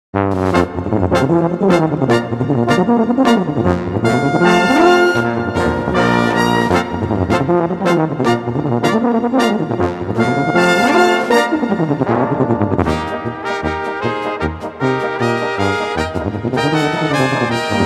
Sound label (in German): Tuba Wahnsinn